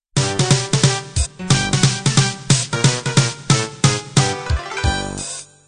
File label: dixie intro